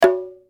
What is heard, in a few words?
bongos